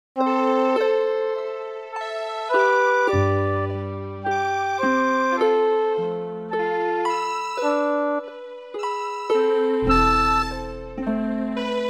greensleeves chords fractal tune

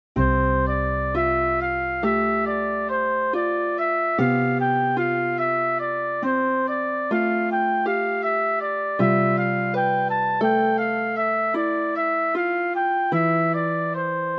motion from unison to from unstable intervals

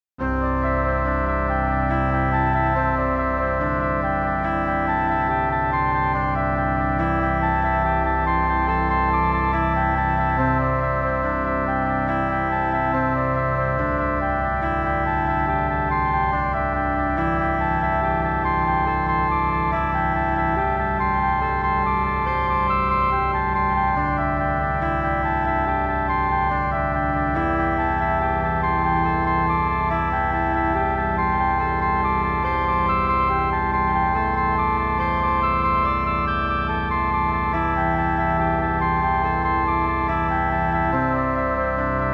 cello flute pentatonic

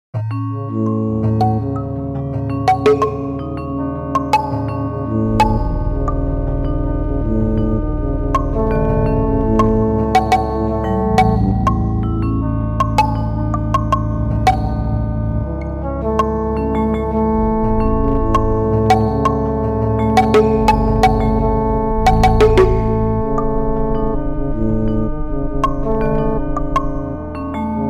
kalimba bongo

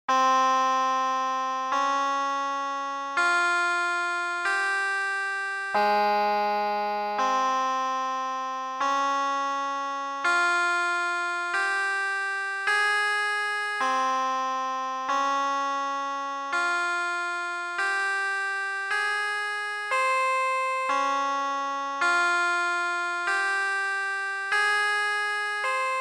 sitar tune

